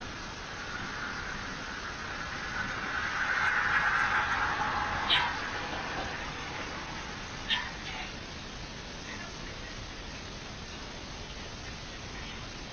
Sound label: Unexplained whispering; (Help me, Help me); cleaned up edited